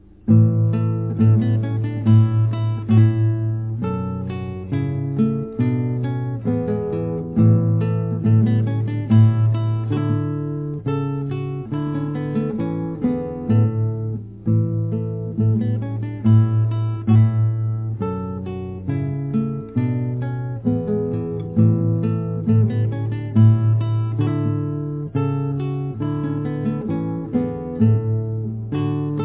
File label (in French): musette